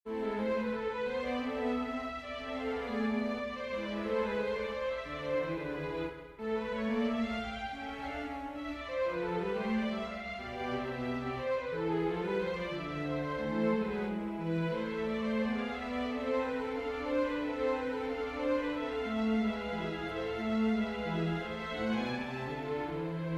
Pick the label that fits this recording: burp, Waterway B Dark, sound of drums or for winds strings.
for winds strings